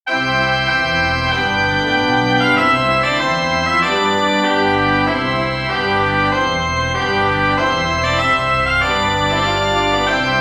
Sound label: trumpet tune march major for organ; clarke jeremiah